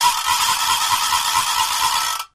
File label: Noisemaker Metalli